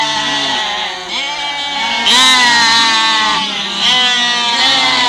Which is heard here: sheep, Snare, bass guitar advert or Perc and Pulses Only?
sheep